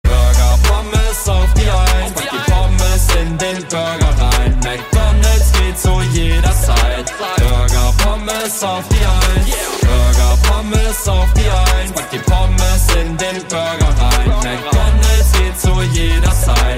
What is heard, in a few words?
Song Ringtone